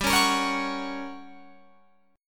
G Minor Major 7th Flat 5th, G, down, Guitar, Open F; GmM7b5; strummed